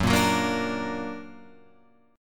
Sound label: F sharp Augmented F sharp Guitar Open F; strummed; 6,5,6,5,5,5; JGuitar